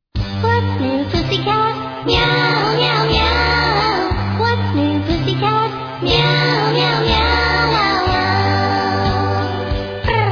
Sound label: Cat Nips Song